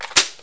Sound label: m4 clip in